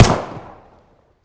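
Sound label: single pistol shot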